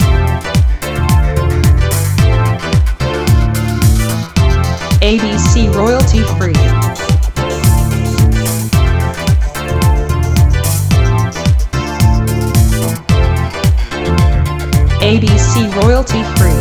Chill loop

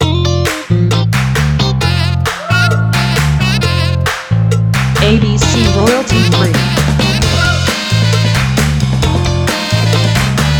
Soul Party

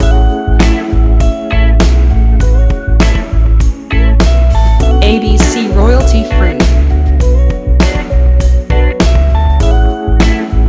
Smooth Soul

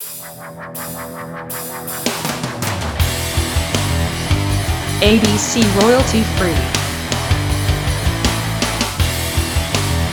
Modern Hard Rock shorter

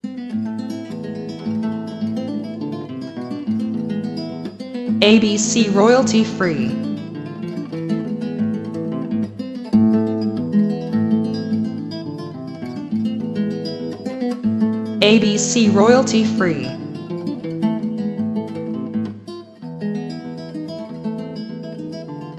A Minor Etude

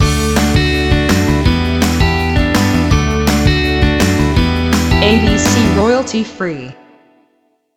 Happy Commercial logo

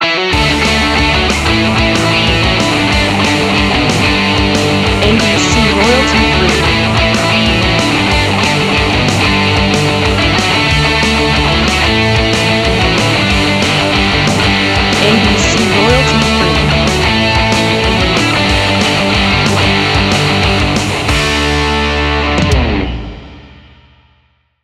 Motivation Punk

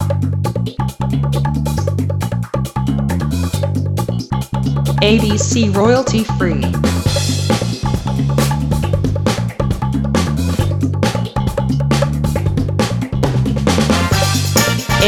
Action funk

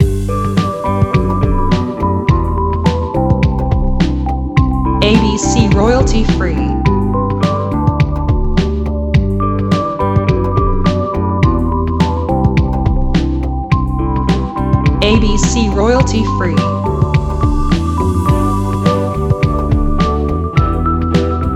Commercial Background loop with Guitar

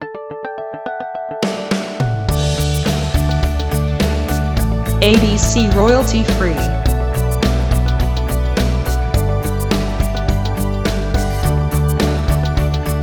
Strong Corporate

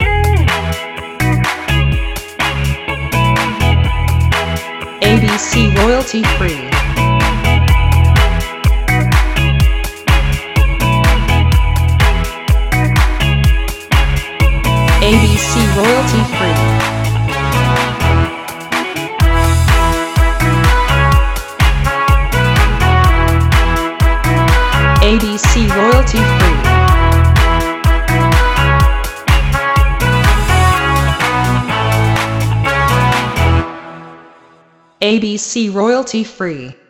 Pop Summer

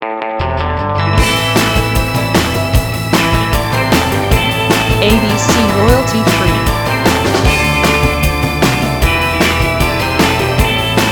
Surf Indie

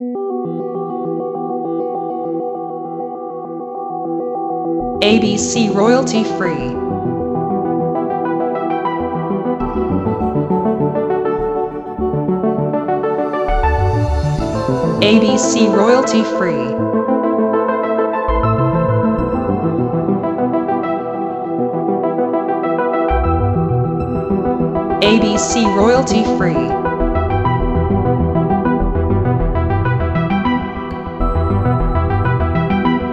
Minimal Documentary

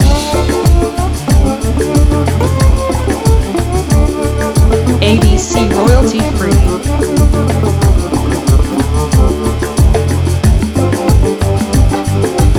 Slide Groove loop distortion

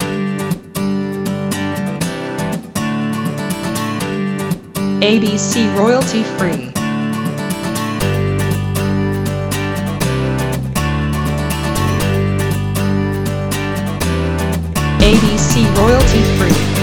pop background

